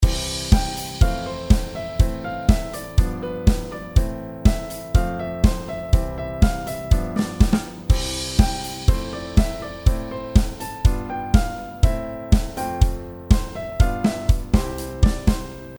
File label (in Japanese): コードにメロディを付けた